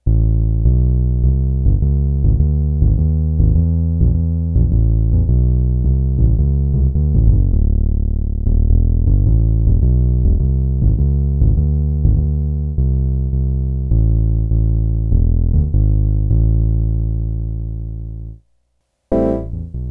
Bass and Bass & Piano, Multivox MX-20

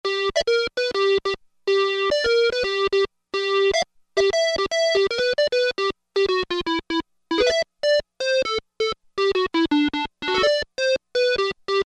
Reed, Roland Juno-60; Factory presets

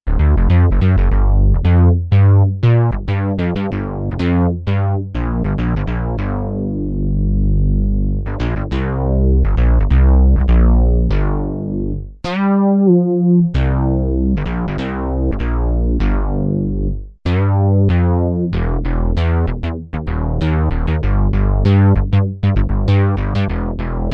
SYNTH BASS, Korg DW-8000; Factory Patches

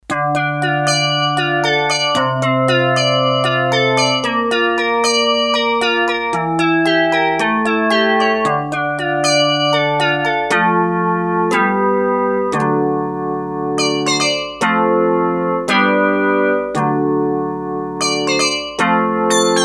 Synth, Korg DS-8; Factory Patches

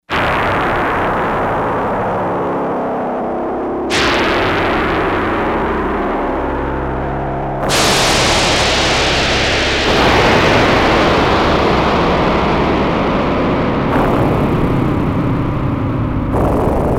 Storm Wind, Yamaha DX100; Factory Patches

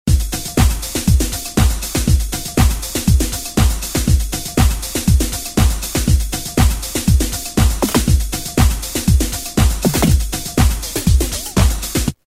909 Dsco 2 120; Roland M-DC1

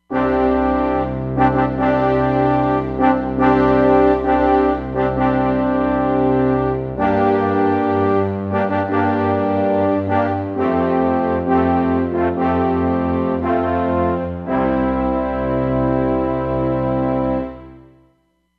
Orch & Horns, Roland Fantom XR

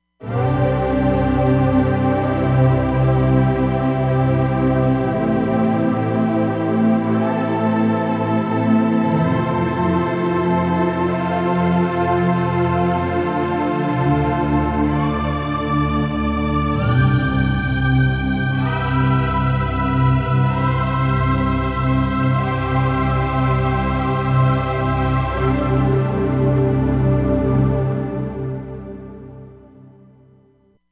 Riven Pad; Roland Fantom XR

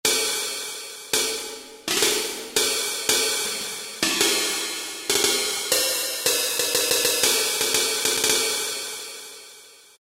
Cymbal

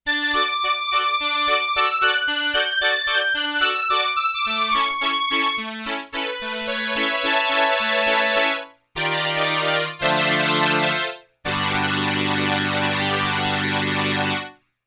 STRINGS II with Ensemble I, Roland RS-202

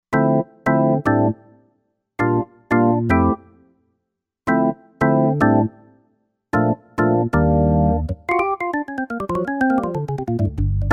Perc Organ

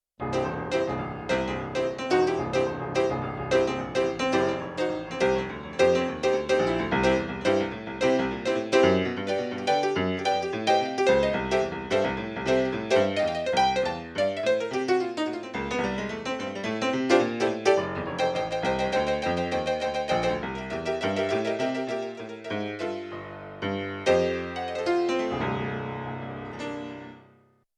Rock & Roll 61 Low, Yamaha CFIII Grand Piano; Yamaha TX16W

